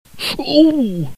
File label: ooh exclaim